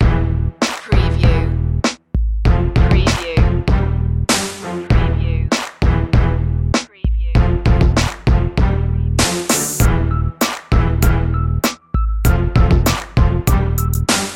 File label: sacred instrumental